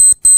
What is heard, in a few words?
Announce; fenrir